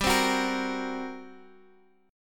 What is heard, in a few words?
G Minor Major 7th Double Flat 5th G, Guitar, Half Step Down